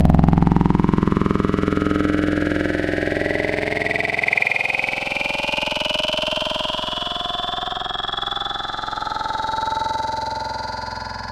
Vocal Formant Bot, Wavetables; Synthetic's Serum Collection; ESW Core Wavetables; Echo Sound Works Core Tables

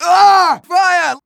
fire, player; Team Fortress 2

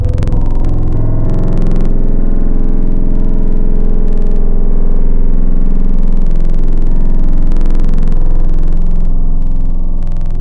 Futuristic, Tropical Mallets

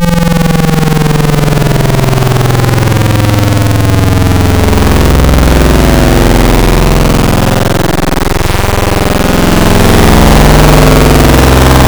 Broken Ramp, Xfer Serum Wavetables; Synthetic's Serum Collection; Cymatics - Nuclear for Xfer Serum Wavetables

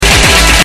glitch; lolicore

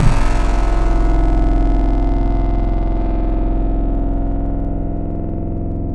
Chroma, Tropical Mallets; Synthetic's Serum Collection; SparkPackers

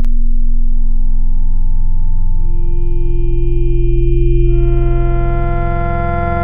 Metallic Bell; Synthetic's Serum Collection; Echo Sound Works Core Tables

